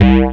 106 Bass C, One Shot Bass

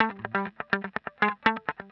125 X wah A, wahwah; PowerFX - Nu Jazz House

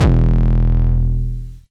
808 Dist - E1, Kicks; Drums; MBase 11 - 808 Dist

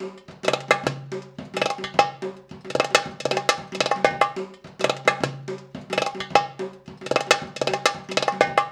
PERLP, ArabianTraditions

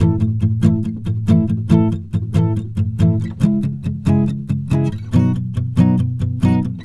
Acoustic Pop Guitar 140BPM C#min; Acoustic Pop Guitars; Vengeance - Essential Clubsounds Vol.4; Guitarloops

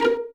Violins pizzicato A#3; Best Service ProSamples vol.51; Classic Orchestra 2

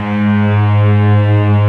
Textural Strings

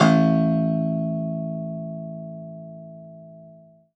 Rubbery Piano, prepared piano; A-1; Total Piano

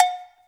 COWBELL, PERC

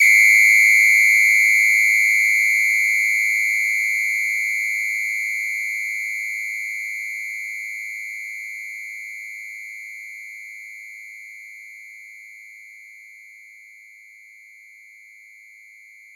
Whistle Long, percussion; Roland BK-5

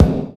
BD, BD Dance

